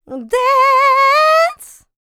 LICKS, Vocal R&B Dance; LT DANCE

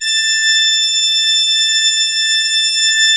A5, Cathedral Organ; ILIO; Propeller Islands Cathedral Organ

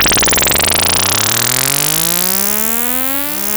MOOG A#3, Synthesizer; Hollywood Edge; Giorgio Moroder Rare Synthesizer Collection; MLTI.MOOG

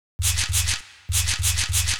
Cellar Shaker, Acoustic Beats; Sonic Foundry (Sony Creative Software); Myths of Technology

